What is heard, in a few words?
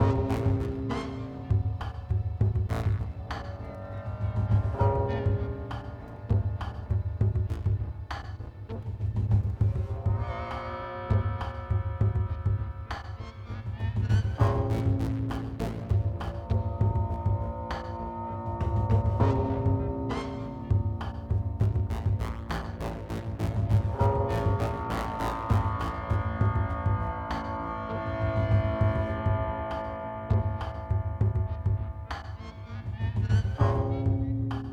Music (Gameplay State Tension); Music Hideout1; H1 Level Area=Narrow Streets